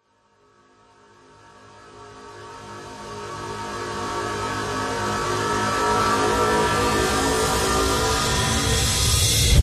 horror riser ghostly haunted evil sinister menacing grinding; zapsplat